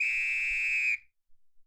Whist Lng

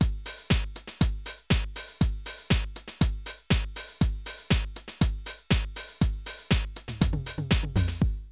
drum loops, House & Garage

